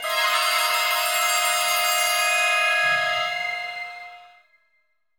Flourish, soundtracks; bolt 2008 pc gamerip

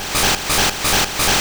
Wing Attack

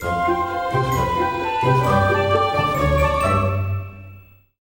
sting career positive; The Sims 3; Windows

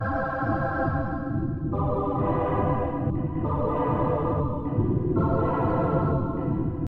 Ambient

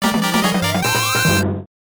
Won Medals; Buttobi Striker; arcade

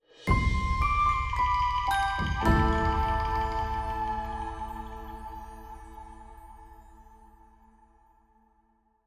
complete Quest Stinger

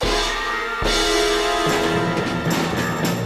Battle START

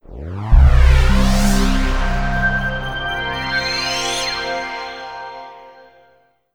Startup; PSP system sounds

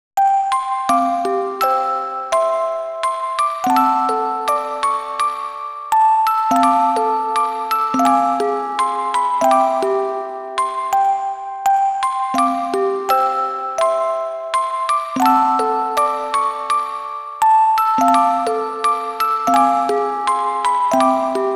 Orgel; Aitakute... Your Smiles in My Heart original game soundtrack vol.2

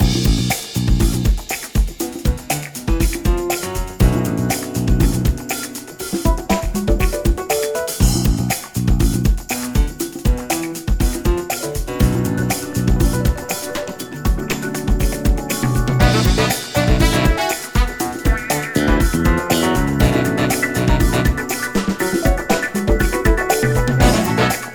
Main Menu (Funk)

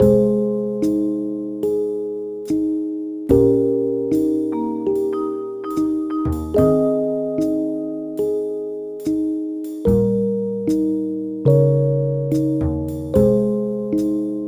BGM FIELD SEA

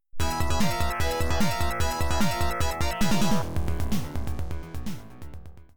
Loop Special; lights...camera...action gottlieb premier pinball gamerip arcade

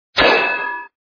step metal cap; Super Mario 63

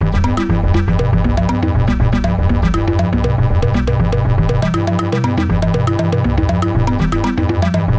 Clockwork Machines; LSD Dream Emulator; ps1 gamerip 1998; Ethnova

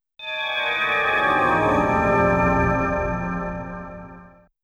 Game Over; Blazing Star; Yumekobo

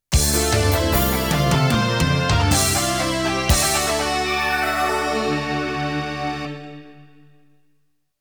Stage Clear BGM

